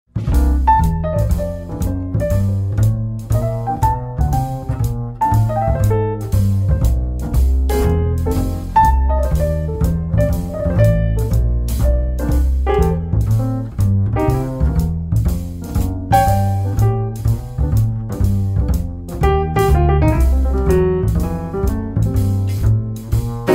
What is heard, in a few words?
bgmusic